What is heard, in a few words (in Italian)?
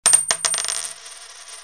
Moneta Che Cade